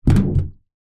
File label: foley balloon large inflated catch